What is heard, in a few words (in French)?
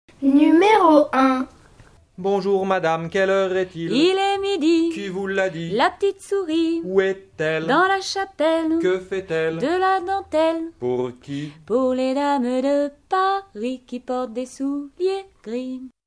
Bonjour madame Musique